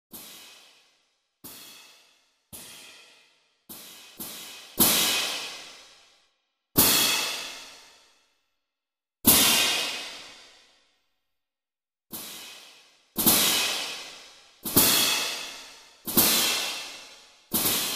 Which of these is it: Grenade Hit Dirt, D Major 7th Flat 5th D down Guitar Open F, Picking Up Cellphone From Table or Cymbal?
Cymbal